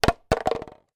household small plastic empty water spray bottle drop concrete